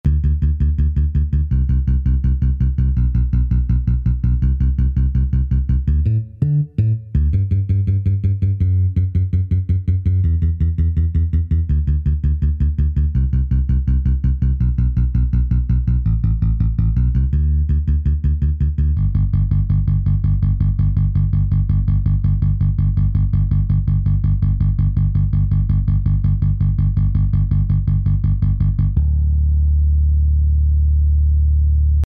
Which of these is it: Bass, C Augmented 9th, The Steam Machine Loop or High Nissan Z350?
Bass